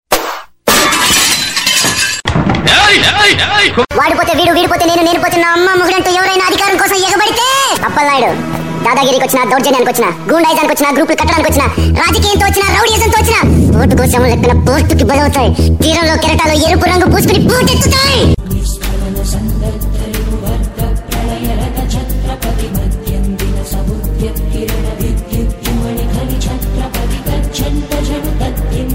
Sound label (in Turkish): Komedi zil sesi; Chatrapati